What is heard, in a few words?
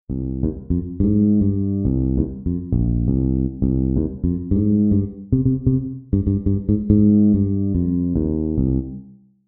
blue finger jazz comp